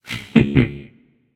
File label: heh heh; smite; achilles